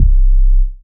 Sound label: Bass C1, club techno; Zero-G